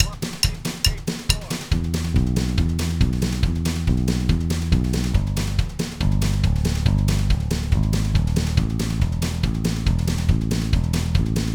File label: blazing fast country; guitar solo